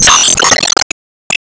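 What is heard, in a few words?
Sound Effect Enemy Summon Wall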